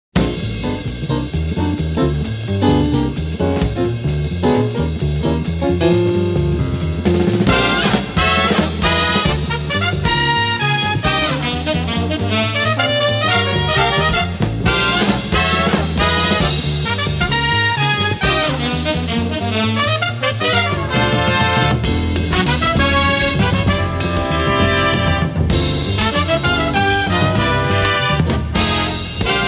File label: thunder